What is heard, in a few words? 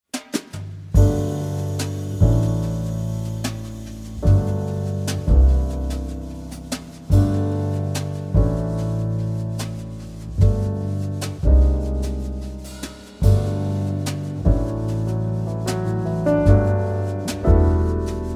Acoustic